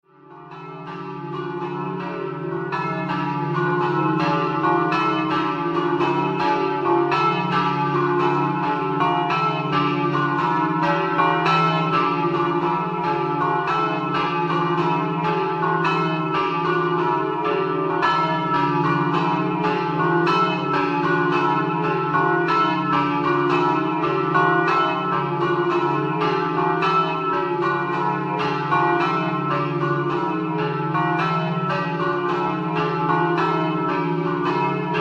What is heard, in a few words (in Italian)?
ZOMPITTA, campane